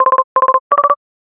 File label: ringer, chirp